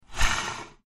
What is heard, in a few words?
Slow Trackball Spins, mouse